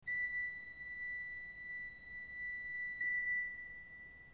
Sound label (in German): ton, orgel